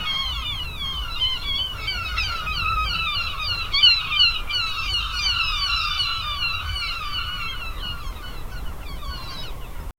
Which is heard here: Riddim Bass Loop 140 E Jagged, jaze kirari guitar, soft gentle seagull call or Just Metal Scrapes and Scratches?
soft gentle seagull call